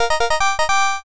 charge trumpet

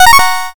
win

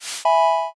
world move

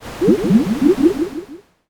poison

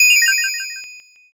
ice magic

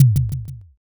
kick

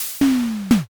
hatch

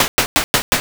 bird flap

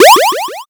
jump

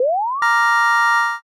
revive

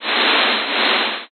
towel

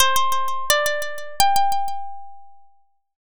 koto

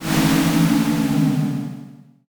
sigh crowd

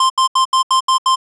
alarm clock

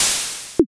airlock

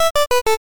stairs down

